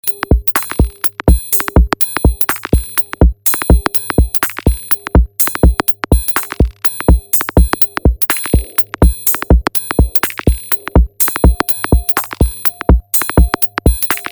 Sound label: nonlinear mix